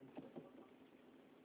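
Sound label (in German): Prius Geräusch neu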